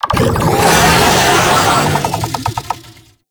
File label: Attack, Vocals; Warframe; Proto Infested; Babau